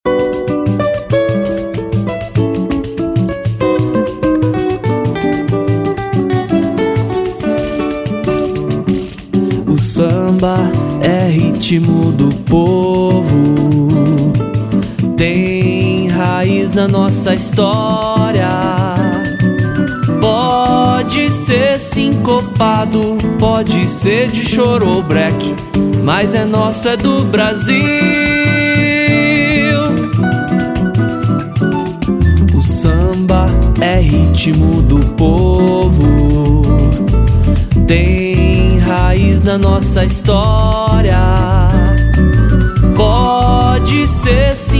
SAMBA DO BRASIL

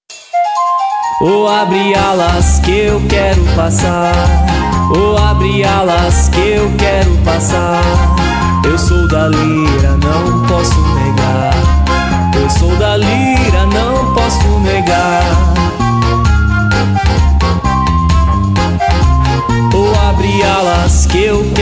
marcha

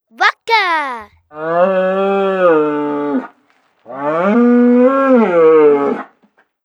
VACA

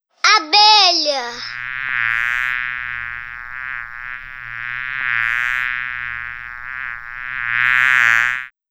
Abelha

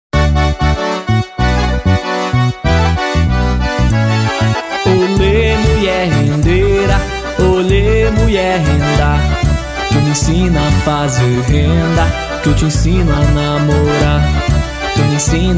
MULHER RENDEIRA

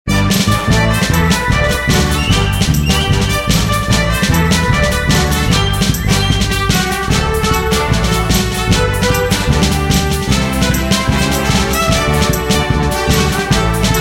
FREVO

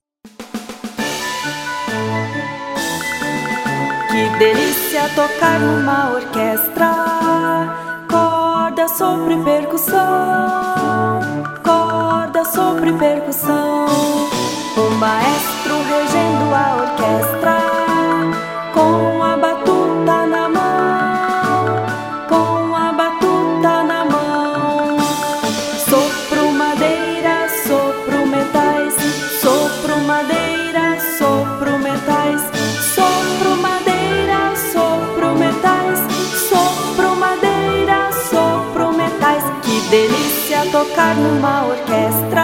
A ORQUESTRA

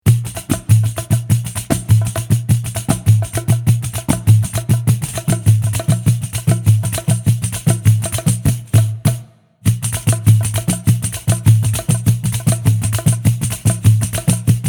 SAMBA LELE RITMO

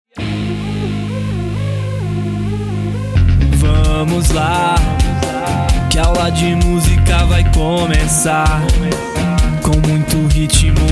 HIP HOP

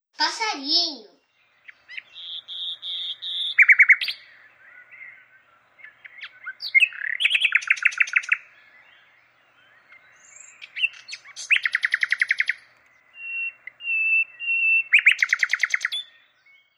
Passarinho

